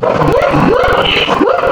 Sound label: Zebra, Animals Wavetables; Cymatics; Serum; Animals for Serum - Gold Edition